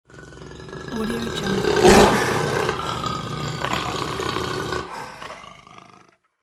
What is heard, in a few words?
jaguar